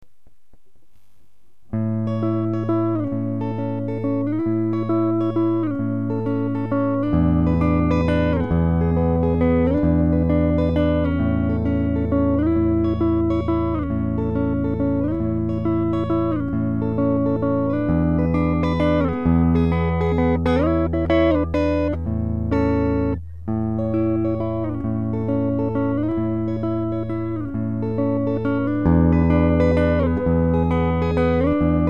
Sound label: rain drops; guitar; (by K-G.C.Lindsay)